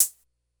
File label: Closed HH, Drum Machines, Korg DS-10